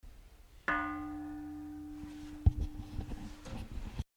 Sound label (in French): cloche; Strasbourg, église protestante Ste Aurélie